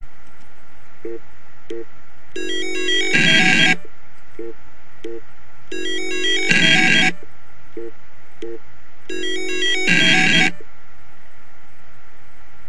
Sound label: maxtor melody desktop drive with stuck spindle